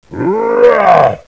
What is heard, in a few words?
zombie die